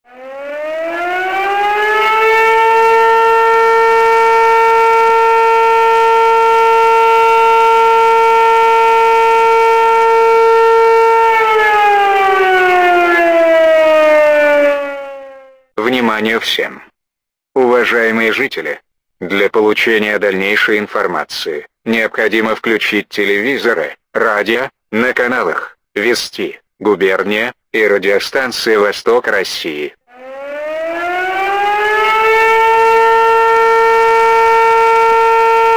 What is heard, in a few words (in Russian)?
sirena; s-40e